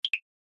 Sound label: bip bop